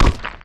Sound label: dung ball bounce; Hollow Knight